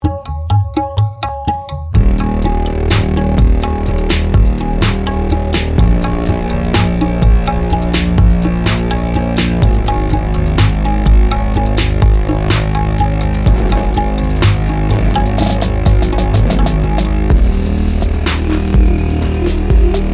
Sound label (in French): Tablas